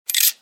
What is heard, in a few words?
coin drop